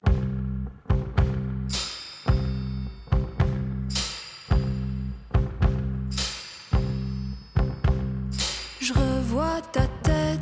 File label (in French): Sirene